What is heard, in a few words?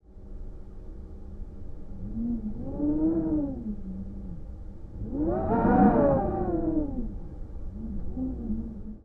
Wind, Interior, Howl, Forceful, Tonal, Vocal, Gust; Hzandbits; Howling Winds